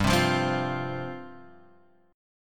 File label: G 7th Suspended 4th G down Guitar Open F; G7sus4 strummed